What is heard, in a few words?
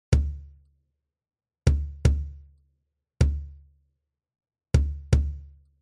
bass